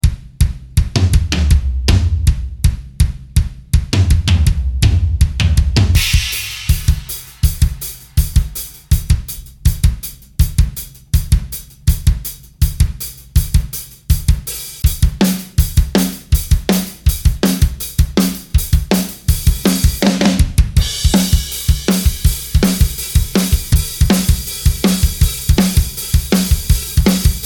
acoustic drum loops metal; presonus

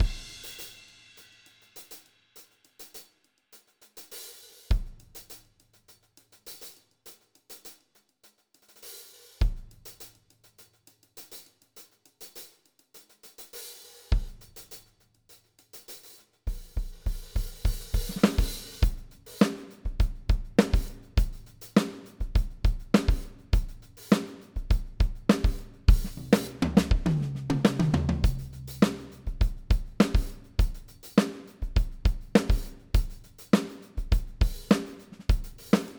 yamaha beech custom funk 102bpm; toontrack superior drummer 3 test

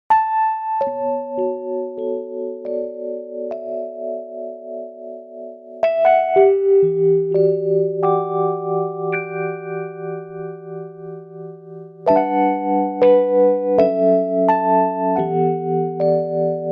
e-piano lounge; xln addictive keys

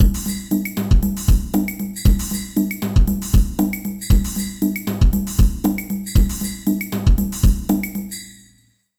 Alesis SamplePad Pro

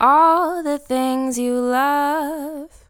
free vocal samples dry; ghosthack

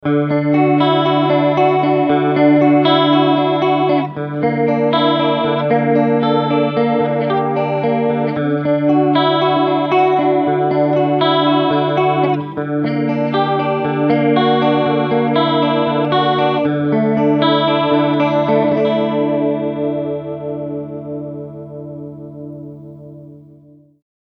mxr analog chorus

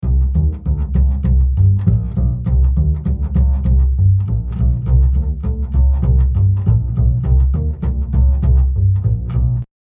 chorus; psp echo

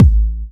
Kick; Uppercussion Conundrums

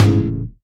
Hit

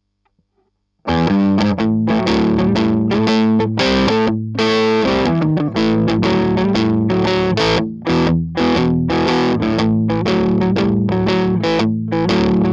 germanium drive smooth highs full boost

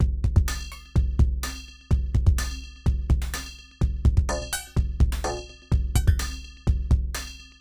nord drum 2

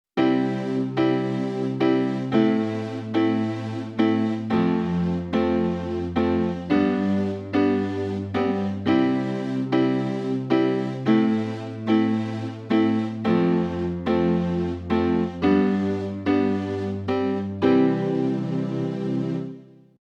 Casio SA 80; Layer: 00 Piano & 47 Strings

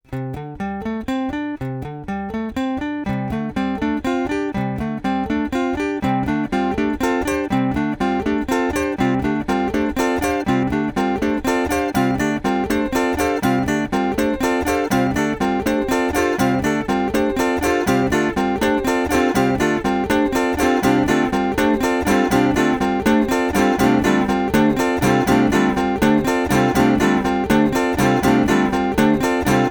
looper stresstest

